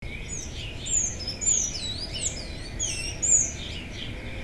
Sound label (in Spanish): celestino, Thraupis sayaca, Sayaca Tanager